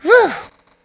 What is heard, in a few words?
hunter pounce cancel; huggy wuggy snpc; elmo